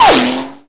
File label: bullet Hit; Parts Pack